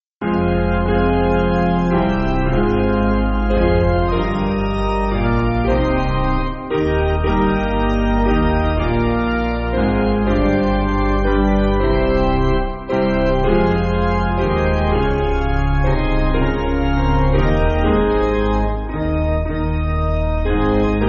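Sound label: Piano, Backing Files for Churches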